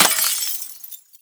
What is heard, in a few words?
break glass phys small; physics; breaking